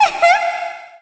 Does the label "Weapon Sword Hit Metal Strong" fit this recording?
No